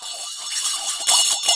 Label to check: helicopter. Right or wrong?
Wrong